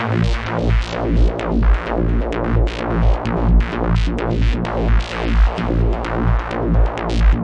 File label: arp mover, synth and electronic loops